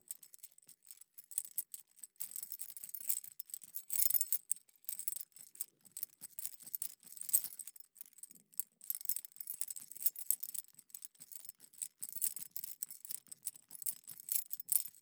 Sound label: Moving Loops, Set of Car Keys, Small Movements, Subtle, Loopable; Badlands Sound